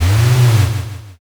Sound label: servo